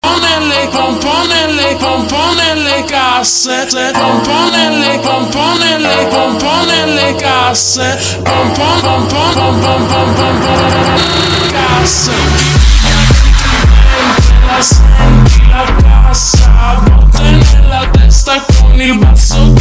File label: bass